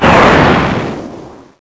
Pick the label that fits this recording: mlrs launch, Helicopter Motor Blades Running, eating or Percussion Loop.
mlrs launch